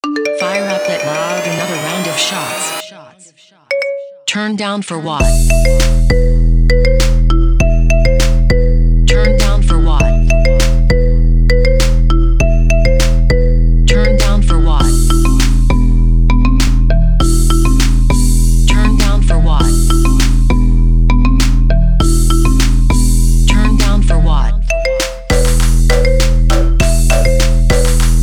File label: marimba remix